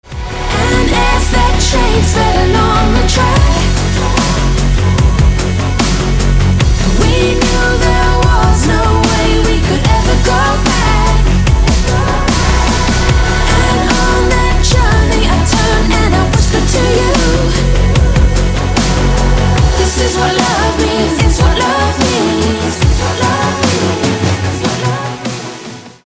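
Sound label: melodic track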